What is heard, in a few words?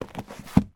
household small photo album slide into box case